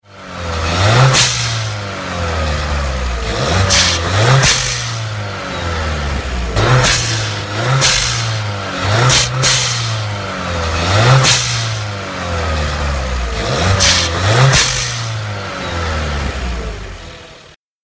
Volkswagen Golf MK7 1.2 1.4 TSI EA211 BLOW OFF VALVE